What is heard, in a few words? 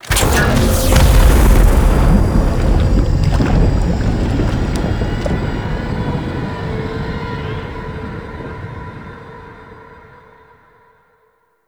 Console Activate; Amaryst; Warframe; Fortuna; Venus Fortuna Quest